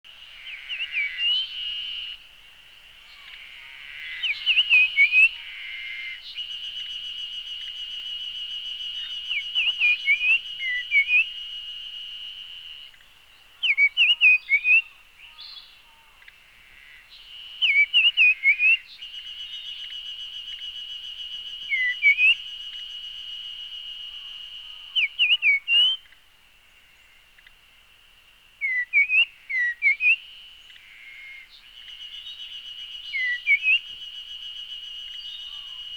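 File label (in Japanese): イカル, 野鳥の鳴き声; 筑紫小野鳥観察ｸﾗﾌﾞ支援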